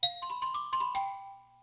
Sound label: Glockenspiel; TSMtoolbox